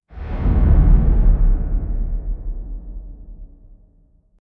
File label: dark medieval death sound; fade